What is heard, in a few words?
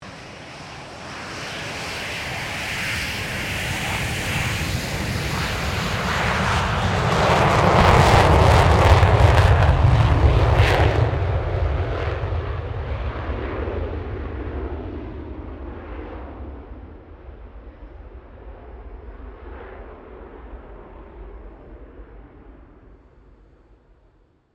AIRCRAFT FA-18 HORNET TAKEOFF STEREO; RAAF FA-18 Hornet; Airplanes (Sound Effects:Vehicles)